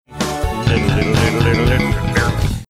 singing along with songs funky bass

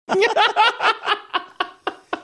laughing

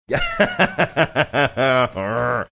laughing growling

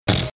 snorting

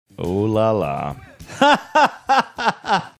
laughing oh la la

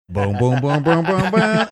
singing opening notes; storycorps

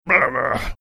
making rabbling sounds

